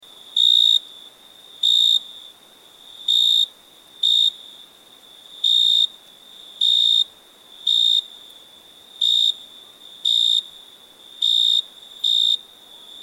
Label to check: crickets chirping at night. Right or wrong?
Right